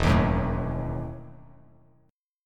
E Major 7th Suspended 2nd Suspended 4th down Bass Standard, chord; EM7sus2sus4 strummed